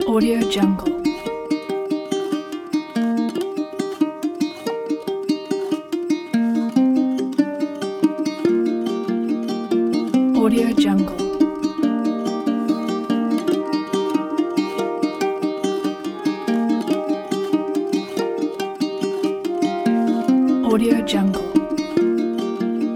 motivational inspirational heartwarming indie folk